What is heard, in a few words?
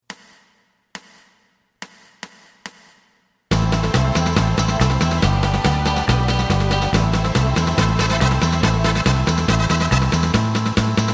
lead; エレキバンド用カラオケ